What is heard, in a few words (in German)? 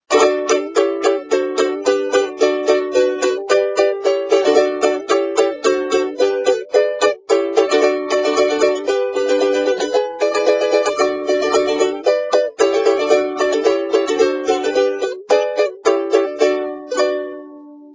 Akkorde